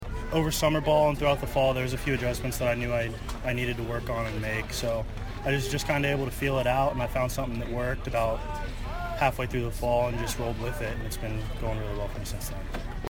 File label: Bell